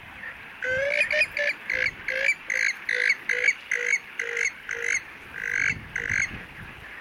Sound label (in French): orn taleve sultane